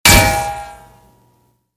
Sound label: bullethit steel